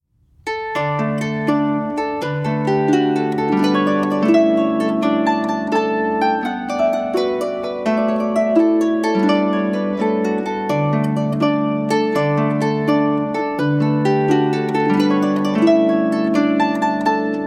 Harp

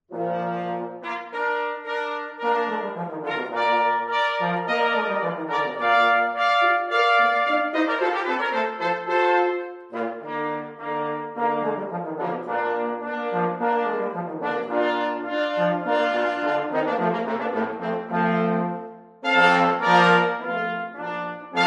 Fanfare, Brass Ensemble; Water Music; Handel

